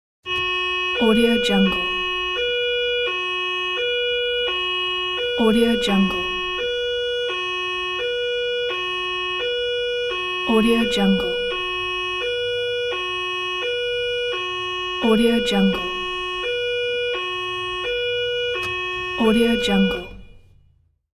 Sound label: siren from inside vehicle